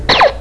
Zap

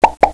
Pop Pop

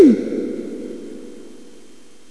Tumpy Echo

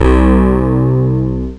Pulsar

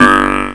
Frogs

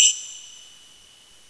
Ding

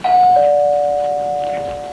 Door Bell

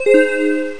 Bottles

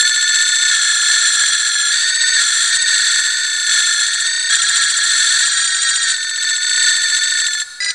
Defective Ring

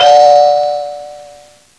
Chime

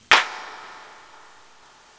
Clap

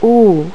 Oooo

